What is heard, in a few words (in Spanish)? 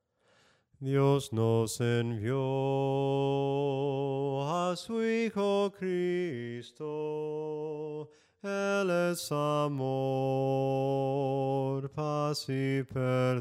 SOPRANO CANTADO, himnos en melodia soprano